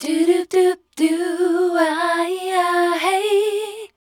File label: #D, Voice Spectral; MELODIC; Best Service; Voice Spectral II